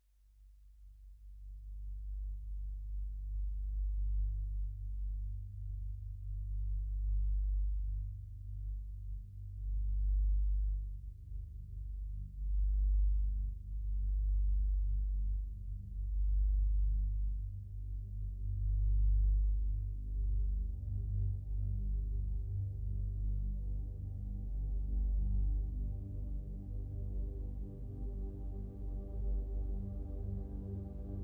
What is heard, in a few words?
Juno6 G Riser; Black Turns Gold; SIMMS